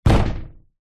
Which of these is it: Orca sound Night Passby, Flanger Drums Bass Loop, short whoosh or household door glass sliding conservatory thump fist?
household door glass sliding conservatory thump fist